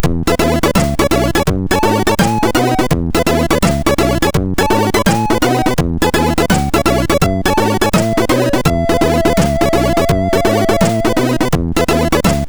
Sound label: Jazz-Stil, sid MOS6581R2